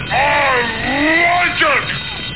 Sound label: TADA; Nothing but Tetris; sextris